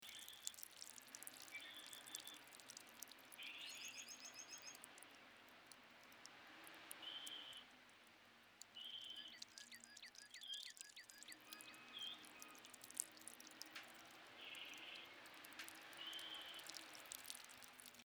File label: dripping scenery